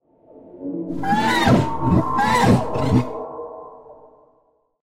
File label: Nonverbal